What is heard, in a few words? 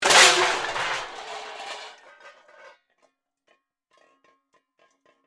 Crash Metal Pots, Crash, Metal, Pots, Pans; Crashes; Sound Effects:Objects